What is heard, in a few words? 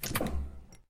Cruiser Headlights On; lethal company